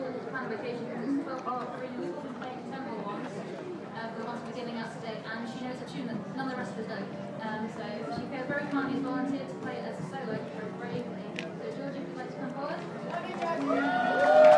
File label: ocarina orchestra; gamecity